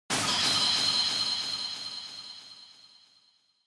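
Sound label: Onoma Syakiin Delay, シャキーン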